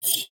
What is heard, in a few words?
sfx, futuristic weapons sound effects